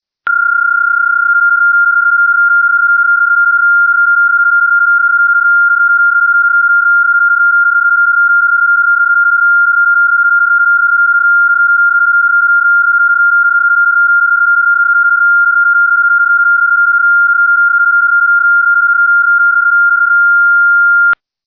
PSK31; hamradio; digital communication modes; Digimodes

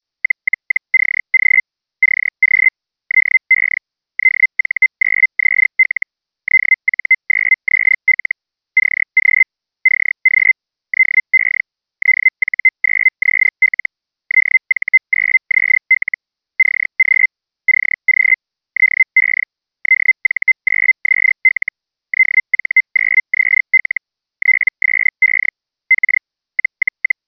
Feld Hell, digital modes; hamradio; Digimodes

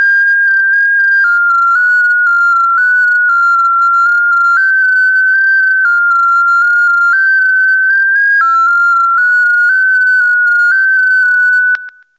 FSQ Call, digital modes; hamradio; Digimodes

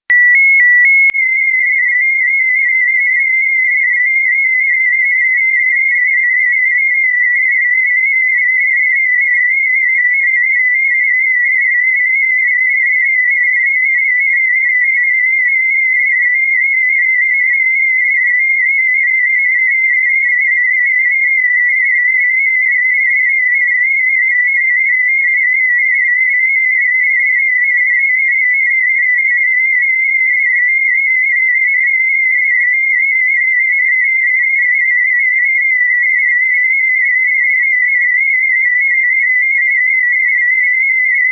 Olivia 8 250, digital modes; hamradio; Digimodes

